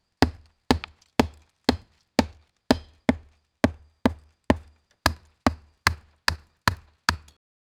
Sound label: a heavy rhythmic chopping, log